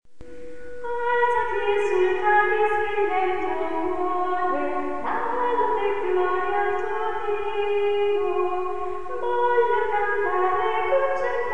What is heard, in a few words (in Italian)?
Soprani